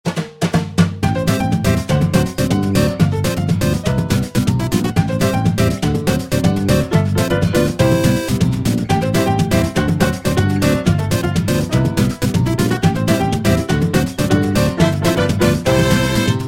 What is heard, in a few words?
happy street fiesta